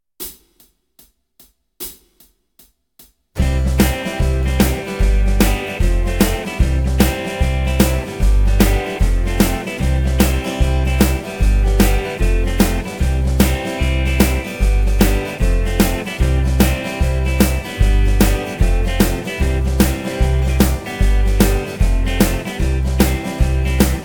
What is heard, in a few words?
Backing track